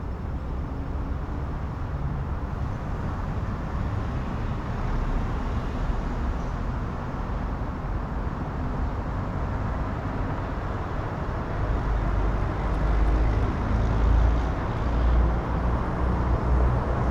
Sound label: Urban traffic distant; urban and suburban; boom library